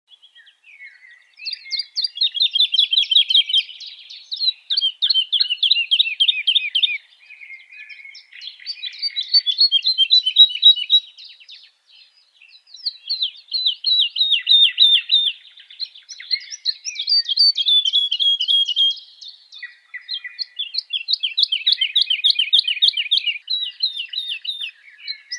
Bird Lark Sound

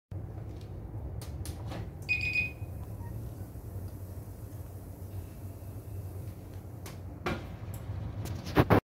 oven bell

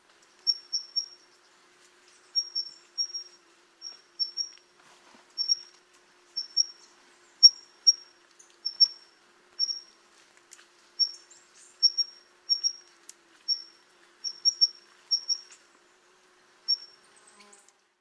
Scaled flowerpiercer; پرنده گل شکاف پولکدار

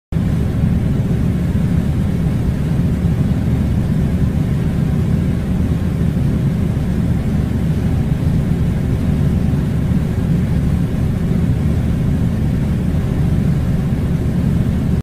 Air conditioner

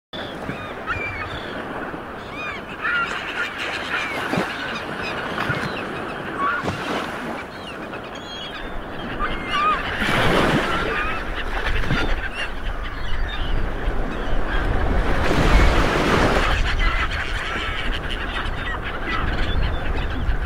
sea and bird

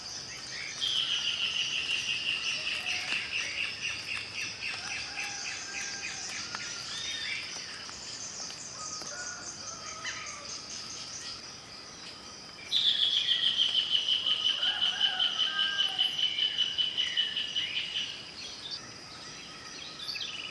Band tailed hornero

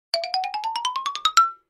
Cartoon walking

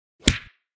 punch

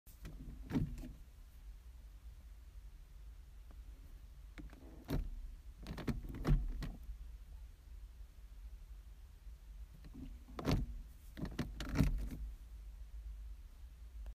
car switch lever

